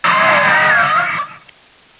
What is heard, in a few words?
zombie pain; svencoop